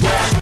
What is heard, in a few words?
noisy, Stabs